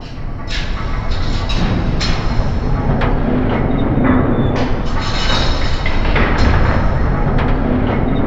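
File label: G4 metal roll; NorthStar - The Wizard VOL-2; TORTION BARS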